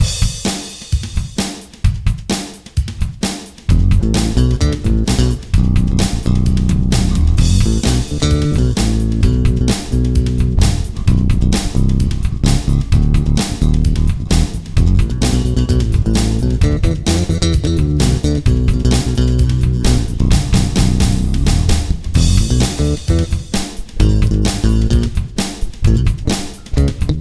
Octave Bass

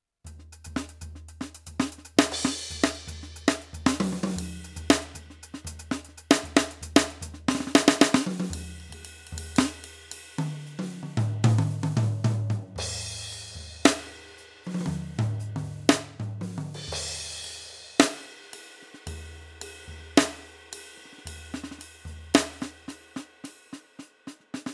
YAMAHA DTX6K3 Little Kit; Kit16

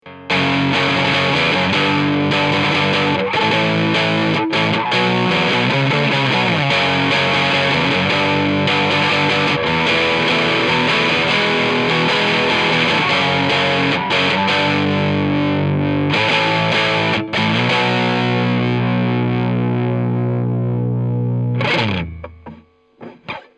Guit Amp Dist; telefunken tf47

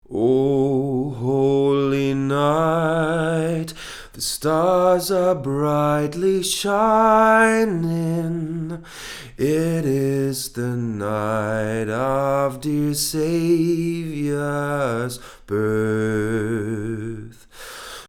Vocals Low; GA-251

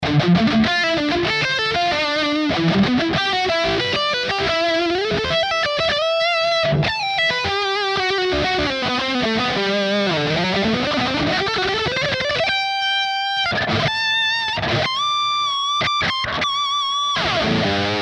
Bridge HB lead; Ibanez AZ226

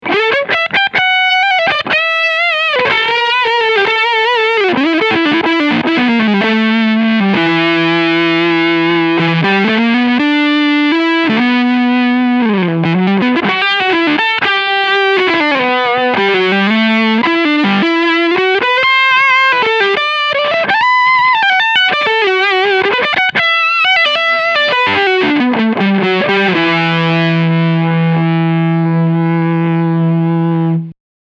Full Gain Mid Boost

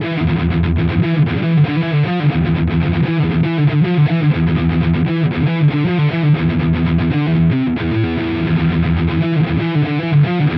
Mooer GE300 Lite Marshall Mesa Boogie Mark

